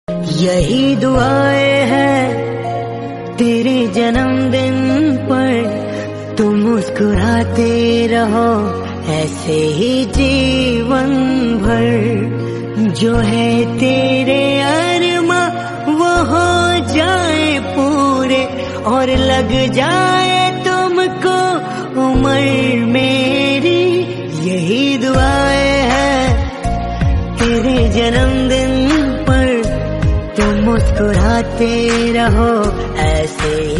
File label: happy birthday to you